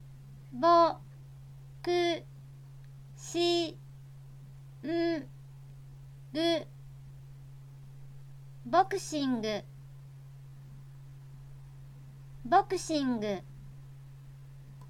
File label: boxing